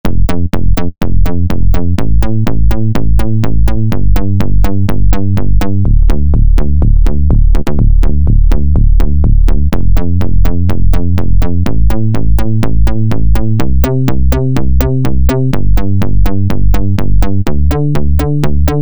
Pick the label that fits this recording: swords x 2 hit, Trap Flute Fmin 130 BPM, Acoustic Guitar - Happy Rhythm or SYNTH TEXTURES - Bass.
SYNTH TEXTURES - Bass